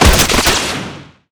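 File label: machine gun Cloth, Damage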